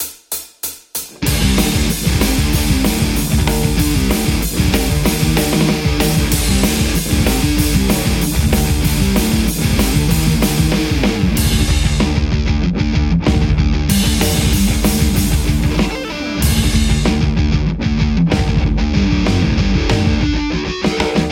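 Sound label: Stratocaster; metal; rockerverb50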